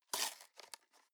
box small tear apart soft; industrie wirtschaft; bueroarbeit

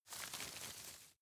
shake soft; berufe; foerster

